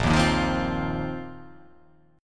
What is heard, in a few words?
A sharp Suspended 2nd Suspended 4th down